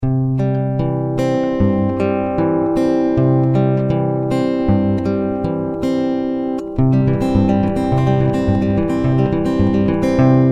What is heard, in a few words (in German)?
zupf